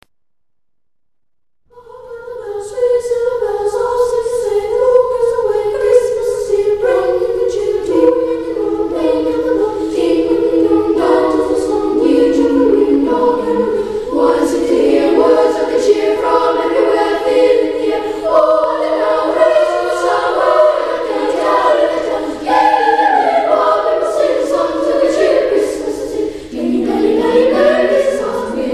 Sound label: boys choir ukrainian ball carol